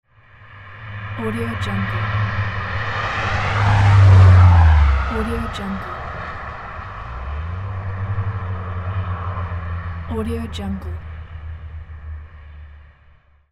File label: car pass by fast